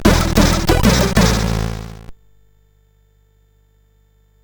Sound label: sid CSG8580R5